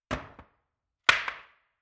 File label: Wooden Pallet Dropped On Ground Concrete Falls On Side Then Falls Over; Tom Hutchings